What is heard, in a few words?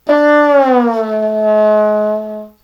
Descent